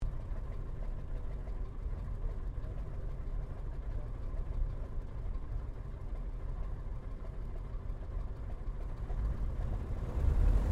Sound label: AIRCRAFT 1916 SOPWITH PUP REPLICA TAKEOFF INTERIOR STEREO; Airplane; Airplanes; Vehicles